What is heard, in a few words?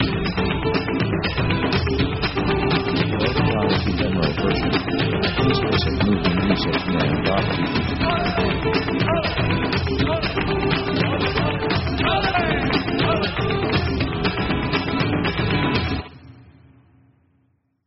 pop spanish flamenco dance